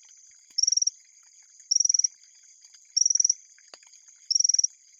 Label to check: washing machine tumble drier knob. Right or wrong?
Wrong